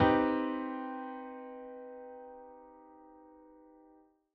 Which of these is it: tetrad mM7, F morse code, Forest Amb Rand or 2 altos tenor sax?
tetrad mM7